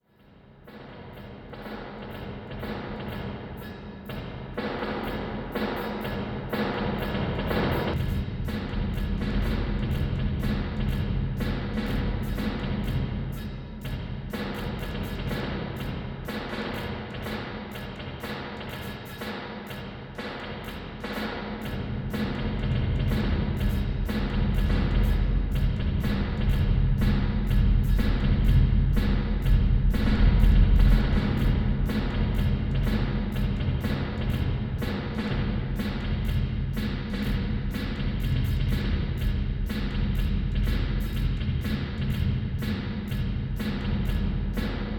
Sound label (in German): Vermona ReTubeVerb EQing und Drive